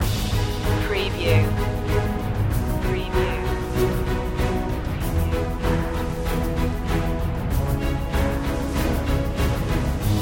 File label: no choir